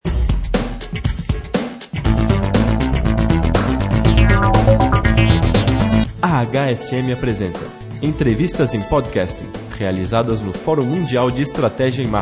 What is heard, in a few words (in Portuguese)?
vinheta abertura